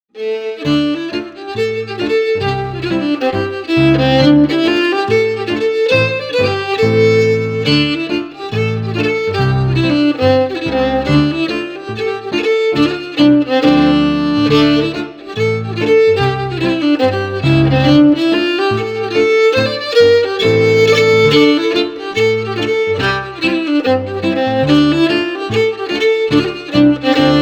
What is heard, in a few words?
Sixty Three to Sixty Five Pieces - FIDDLE PIANO; Jink & Diddle Book o' Tunes 2022